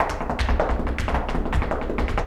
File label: Flamenco Tapping Zapateado Solo; Best Service ProSamples vol.46